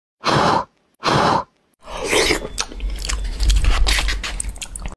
eat soup

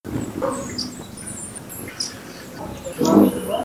Festive coquette

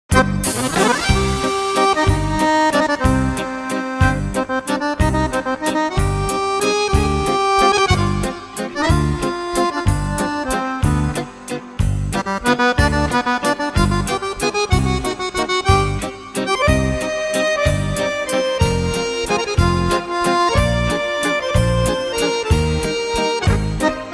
Accordion; آکاردئون

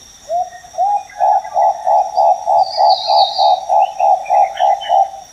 Guinea turaco

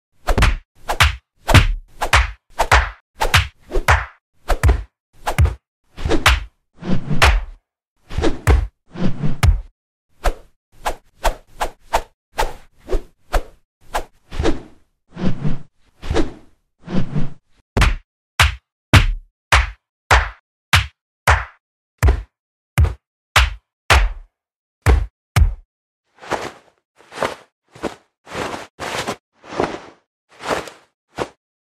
Kung Fu; حرکات کونگ فو

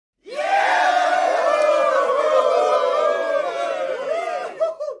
Birthday commotion; هیاهوی تولد

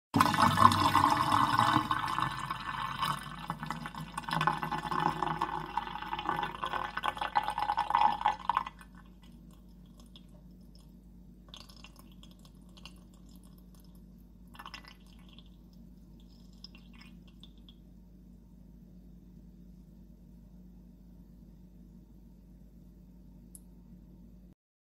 Water in pipe; آب در حال حرکت در لوله

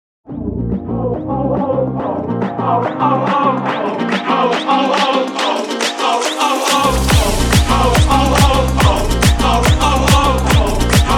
Oo Oo Oo

